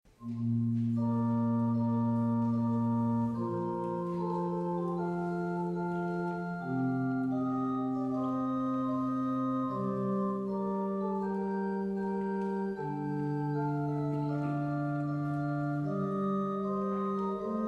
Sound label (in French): Concerto p. orgue et orchestre Andante; Haendel; op 4 n4